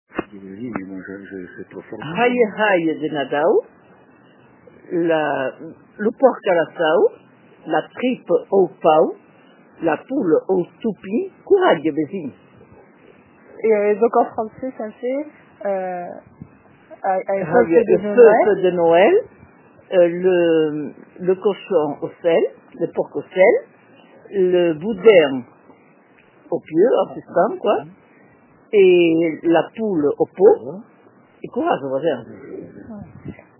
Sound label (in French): chanson incantatoire; le temps des fetes dans les pays de ladour